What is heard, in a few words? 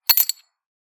heavy coin drop; soundscrate